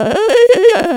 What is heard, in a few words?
pain strong; VIVAE